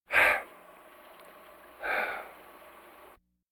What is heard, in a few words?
Vocal effects breathing Moaning Baby